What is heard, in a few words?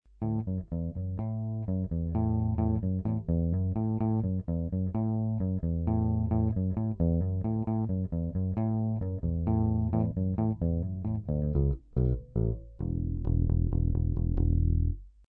EB3, bass